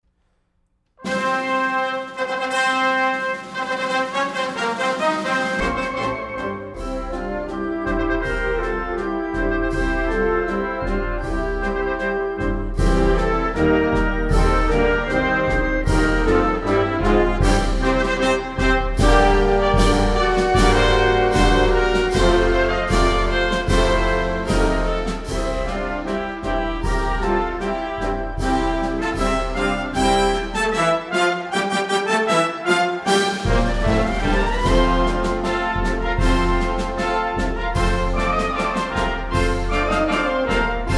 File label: anthem